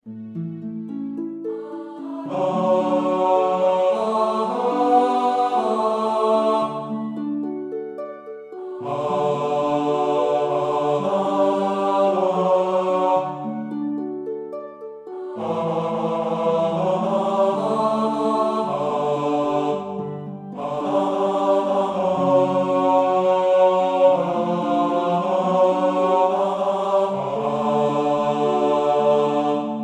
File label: tenor